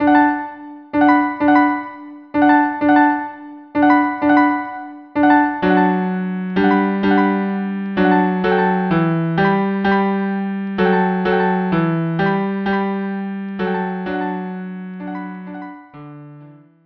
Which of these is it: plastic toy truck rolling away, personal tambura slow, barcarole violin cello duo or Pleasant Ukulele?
barcarole violin cello duo